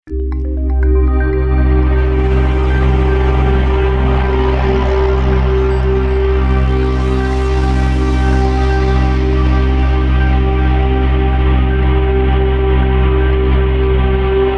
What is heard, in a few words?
Waldorf Quantum